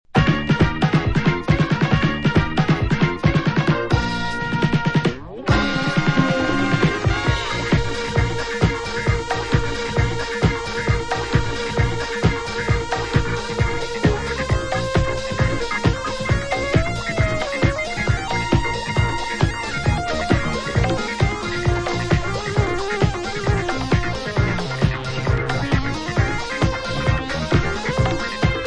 Instrumental; disco

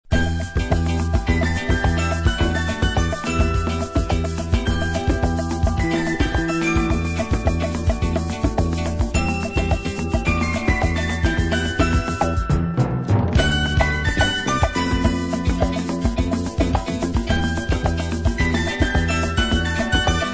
Minor Bossa

